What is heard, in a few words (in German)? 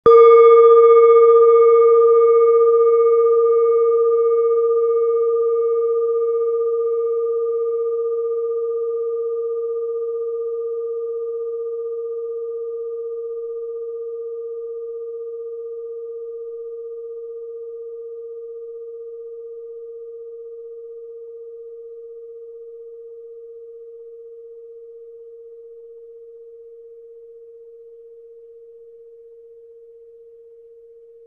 klangschale eisen